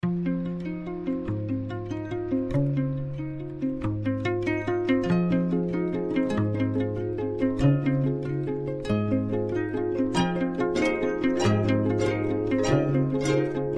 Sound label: instrumental